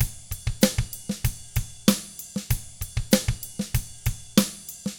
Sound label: HARD ROCK, Drums; 96HARD ROCK